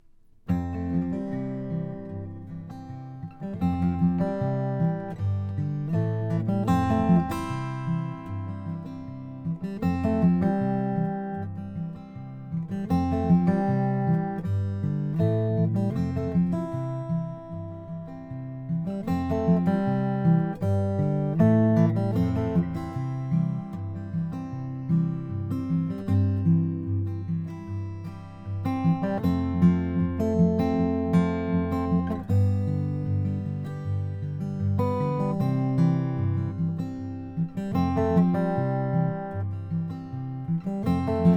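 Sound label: rainsong ws1000n2x carbon fiber, guitars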